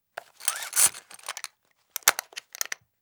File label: Seat Belt Noisy Click; Fairlight Sound Lib